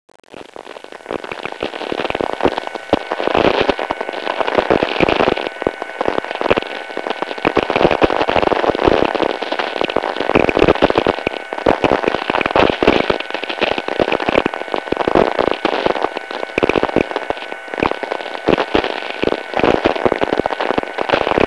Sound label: tx low power